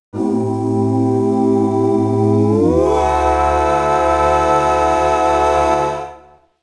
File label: OoWah; beeps; Lin Sprague